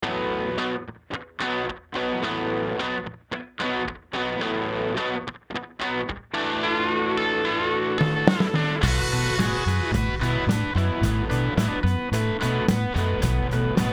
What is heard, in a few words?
vinyl funk rock construction kits; big fish audio